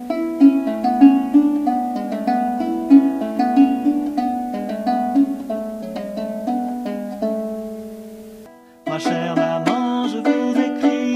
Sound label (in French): lyre